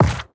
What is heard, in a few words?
paper drop, inventory; ui